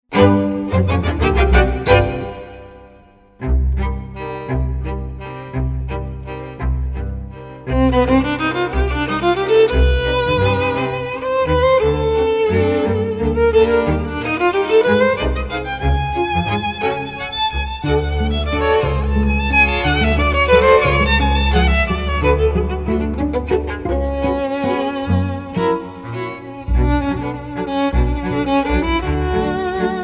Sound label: waltz